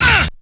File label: VOX; ZERO-G; Datafile